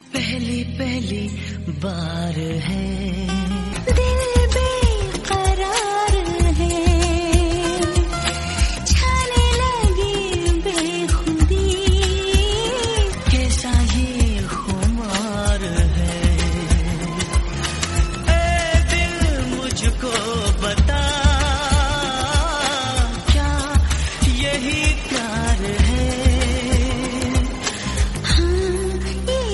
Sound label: Hindi Song